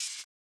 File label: ride, cymbals; Hangover; RoBKTA Sample Pack Supreme